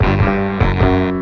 saxy, stabs

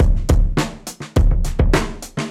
Boombap drum loop

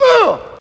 uh; ooh ahh

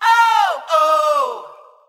"oh oh" male SUNG

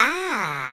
"ah" cartoon, Voice

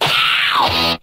ow stab

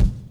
nearby, Kicks Medium

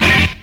pitches, stabs

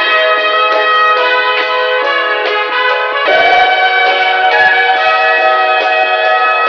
horns whooooo

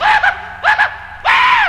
laugh insanity; ooh ahh

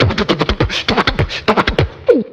Beatbox

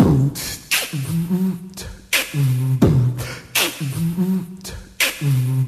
Beatbox

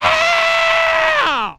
"ah", PAIN; ooh ahh; FUNK

